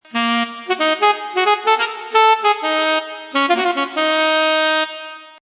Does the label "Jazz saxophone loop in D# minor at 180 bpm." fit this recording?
Yes